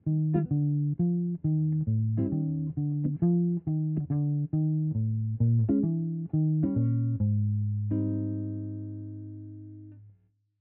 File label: Bassline